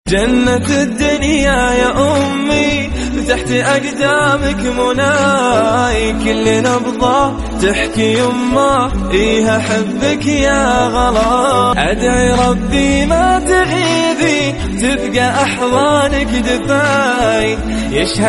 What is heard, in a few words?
devotional, islamic